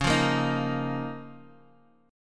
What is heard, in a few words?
C sharp 6th Add 9th; down; chord shape